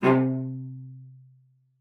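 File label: cello spiccato C3, cello; Xsample VOL-5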